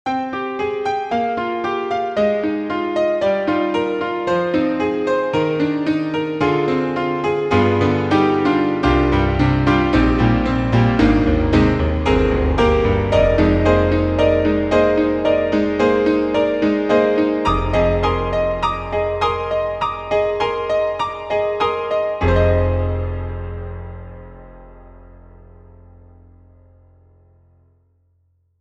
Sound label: Ballad Grand Piano; reFX; Nexus2